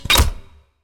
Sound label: Upgrade; Pocket Planes; android